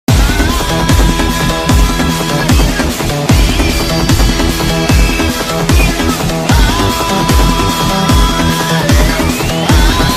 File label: car chasing bgm